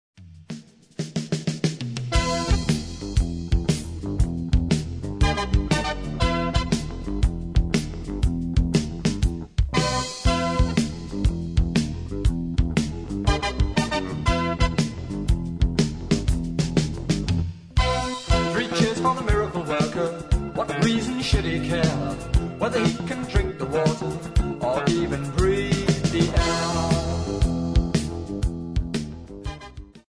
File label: cheers